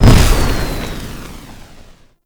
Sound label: Flamethrower Fire; Lunar NY Ignis Skin; Weapons